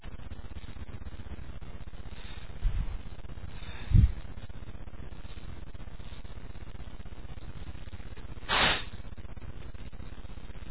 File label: incense, male, achoo